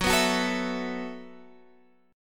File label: E Suspended 2nd Suspended 4th F sharp